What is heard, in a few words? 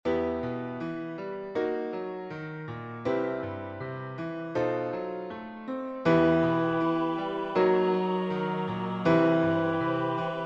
The Slithy Toves Bass, rehearsal tracks